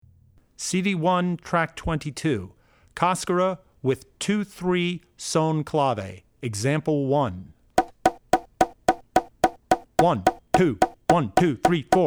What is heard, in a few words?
Cascara (2-3 Son); Drummer's Bible Tracks